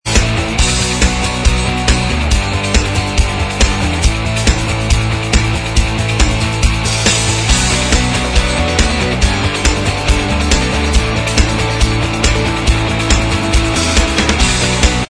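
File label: instrumental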